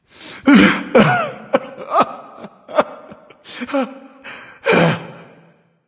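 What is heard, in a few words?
nonverbal boohoo; smite; voicepack; ullr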